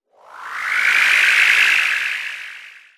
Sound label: beam